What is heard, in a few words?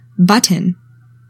button